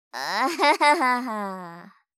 苦笑い; 相づち・反応系【ネガティブ】

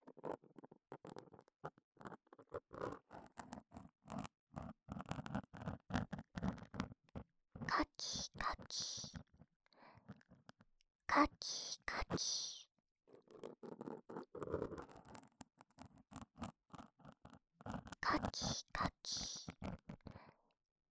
耳かき＋オノマトペ(左耳), ASMR

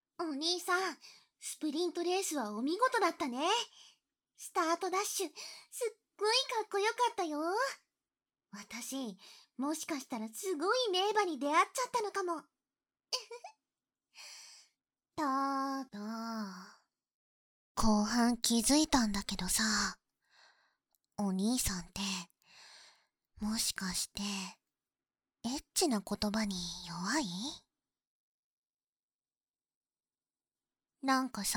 クチュ無し 責め耳舐め追い淫語; 我慢調教～責め耳舐め追い淫語シゴき調教～［射精我慢］

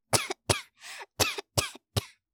咳

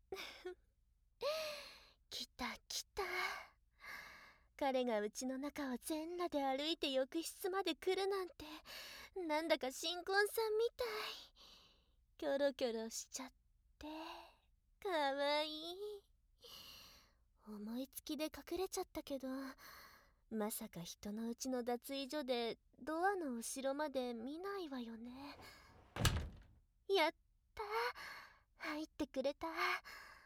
ぐちゃぐちゃ耳舐め騎乗位 お風呂で泡姫ごっこ

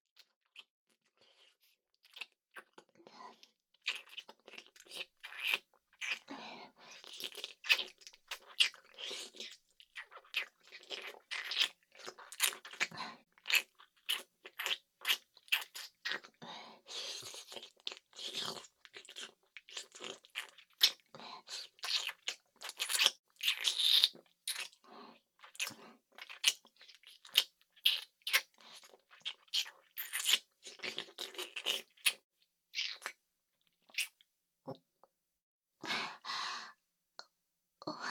まどろみの おはようフェラ; 大学生篇; 淫眠パート

